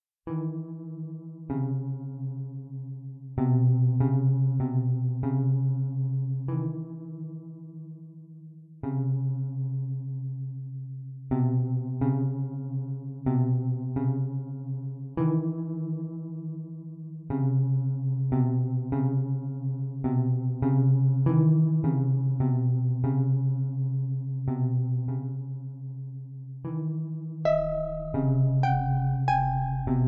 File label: Arpa